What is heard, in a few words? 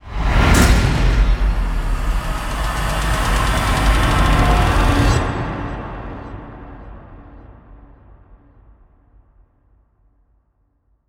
Stinger Modulate ramp